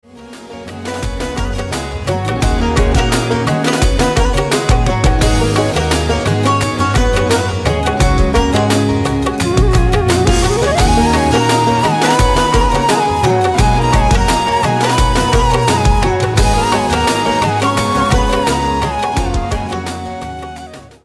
Instrumental Ringtone

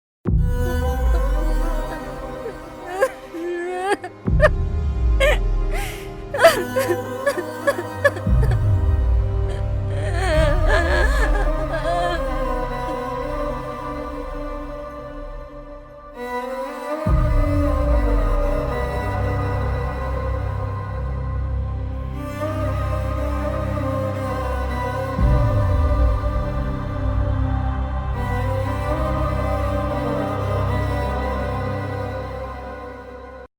Sister Sentiment Background Music; Mufti; Kannada

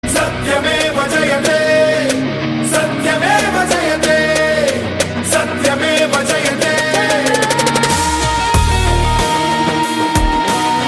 BGM Ringtone; Vakeel Saab; Telugu

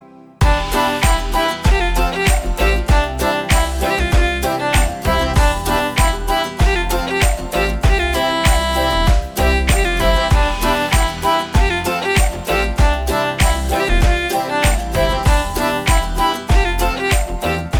Instrumental

